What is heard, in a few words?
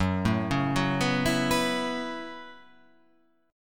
F sharp Minor 11th, guitar